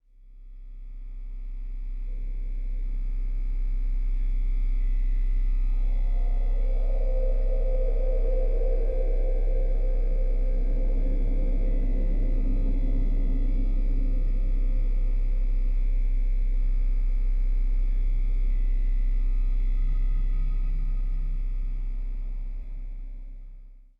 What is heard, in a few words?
hum; Minecraft; liminal-industries; Liminal Resources